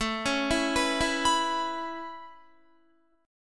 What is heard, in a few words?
A Major Add 9th A Guitar, chord; Aadd9 arpeggiated